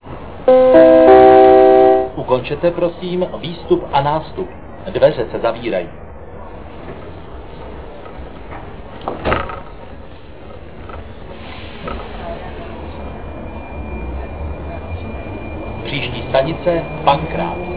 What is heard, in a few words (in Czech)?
c odjezd, zvuky metro; Ukončete, prosím, výstup a nástup, dveře se zavírají. Příští stanice Pankrác